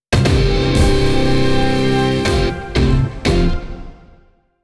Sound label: sting levelup; gamerip; Gunpig: Firepower for Hire; switch windows gamerip 2020